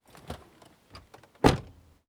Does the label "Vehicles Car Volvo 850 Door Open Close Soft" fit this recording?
Yes